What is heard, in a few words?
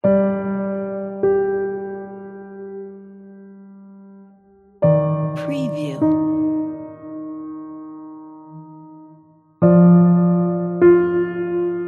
funeral sadness drama piano music